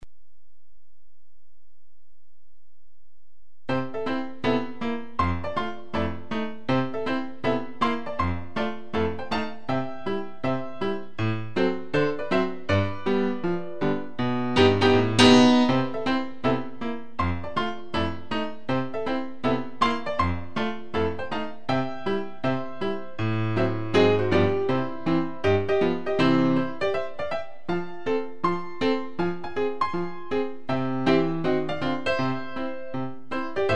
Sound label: Foxtrot 80